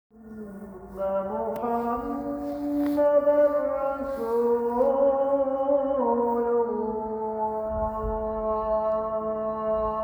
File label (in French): appel priere; sheki